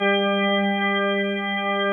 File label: pipe G3, pipe organs; Greytsounds VOL-1